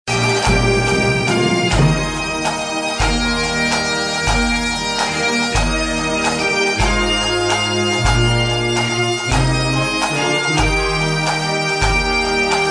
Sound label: ringtone